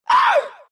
pain, ru; dota2

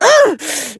pain; Dizzy

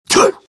chen pain

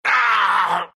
pain, ru; dota2

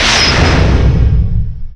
Laguna Blade target

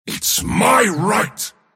deny pain; Mars

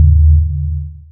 Magnetize pulse

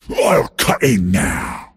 attack pain; pudge

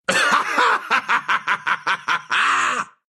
laugh ru; Dota 2

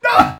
Grunt Hit

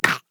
Death; Dredge

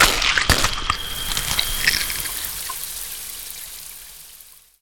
Open Wounds target

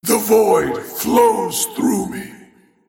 cast pain; Dota 2; Void Spirit

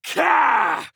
Grunt Physical; Warrior; Lord Of Darkness; Realm Royale

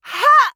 Mage Grunt Physical; Realm Royale

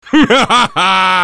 laugh; Kunkka

